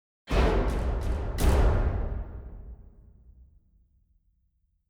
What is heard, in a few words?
orchestra hits; Netease; gank